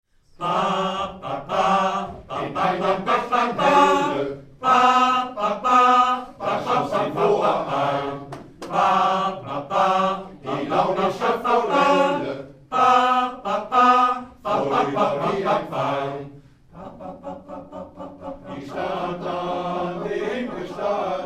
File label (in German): forelle style; mozart